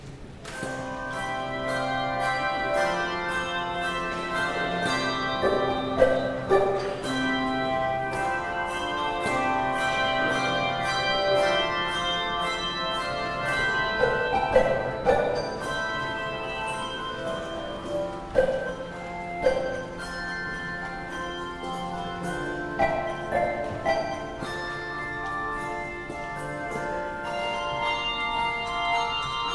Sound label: Handbells